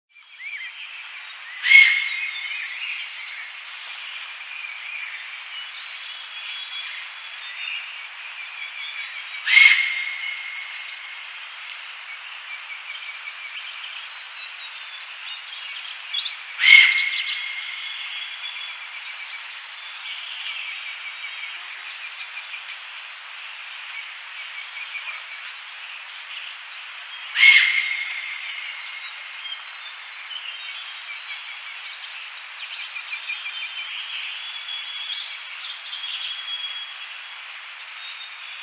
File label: Himalayan goral